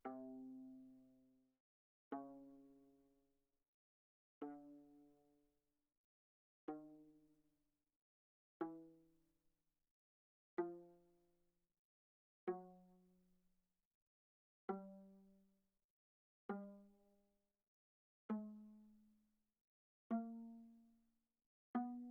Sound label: Viola pizz sul C pp C3 B3